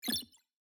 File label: unlock